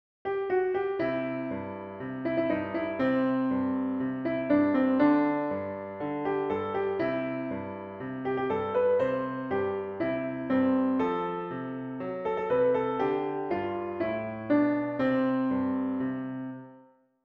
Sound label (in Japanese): ピアノ